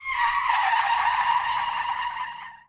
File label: skid asphalt; Blind Drive